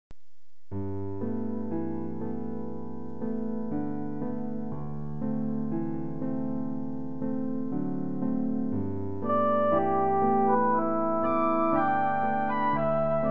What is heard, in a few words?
sextet ww pno